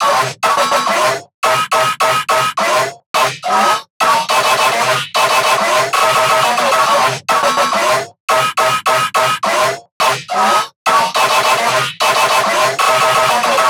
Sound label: Goofy Cool Bass Loop